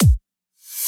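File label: drum beat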